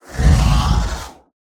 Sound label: Just Whoosh; SoundBits